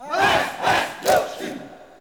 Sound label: ETHNIC SHOUT; AMG; Global Trance Mission VOL-2